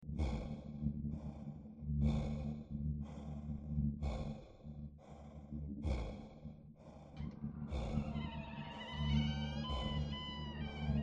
creepy sfx

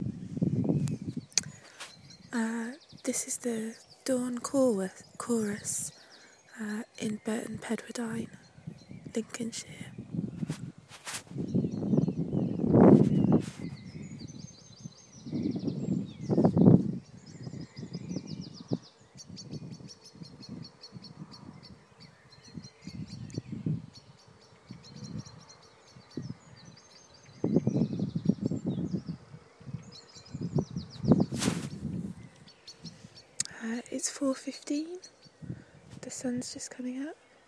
dawn chorus; burton pedwardine

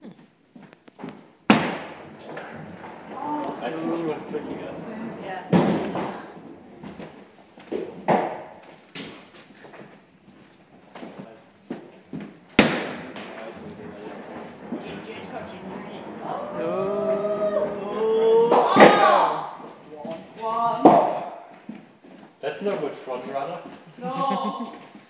bowling; heidi

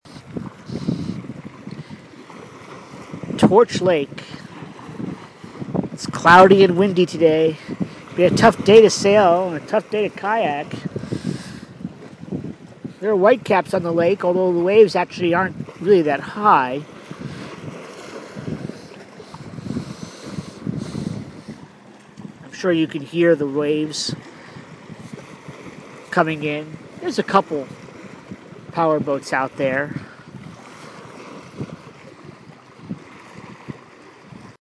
torch lake wind and waves

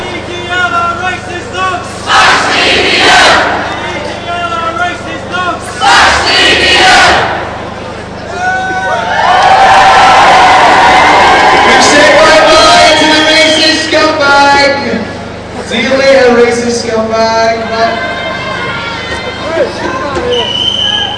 chanting

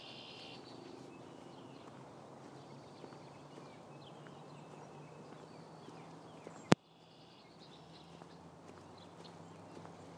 morning sounds

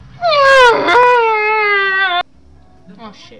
dying whale

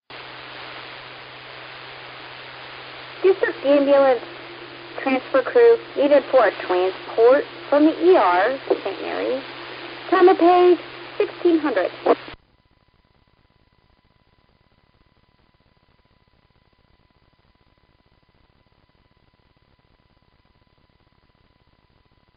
ambulance page

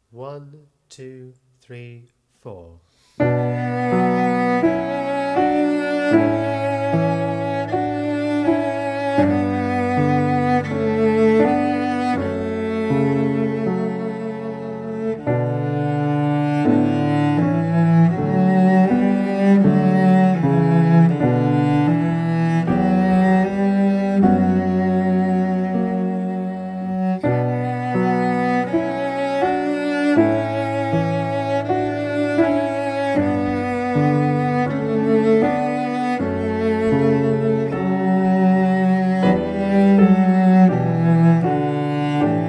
cello; the old castle

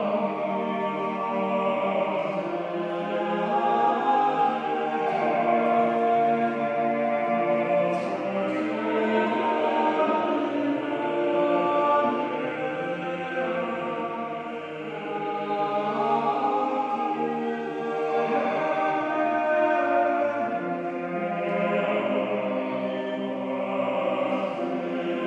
choir

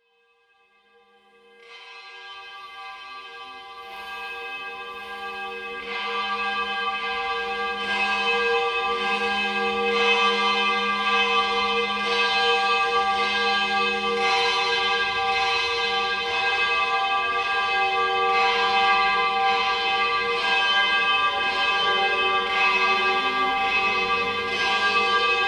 Bells in San Gimignano reimagined; campane italiane

